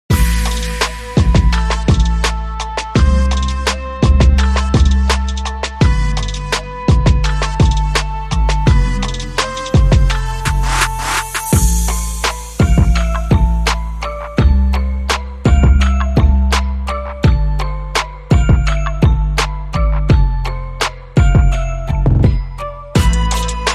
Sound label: Chime